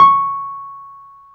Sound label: PNO, Wurly